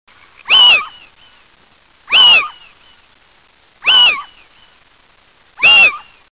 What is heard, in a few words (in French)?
athena femelle